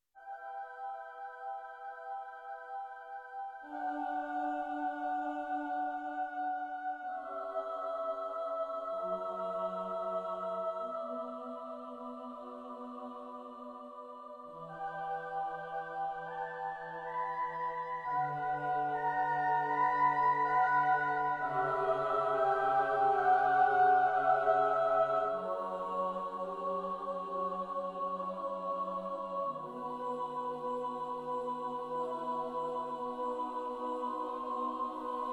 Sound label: Choir